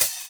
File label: Open Hhat, HiHat; Equipped Music; Loungin House